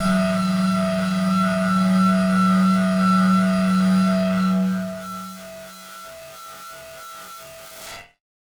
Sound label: Battered Synth; malfunctioning synth samples